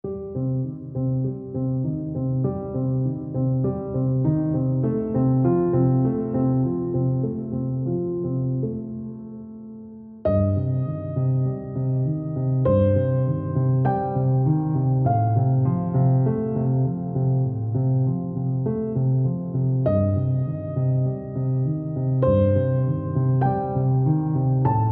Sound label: Piano